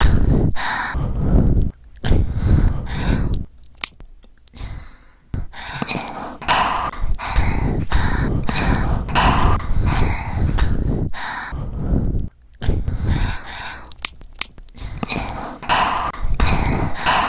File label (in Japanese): 息舐め, ASMR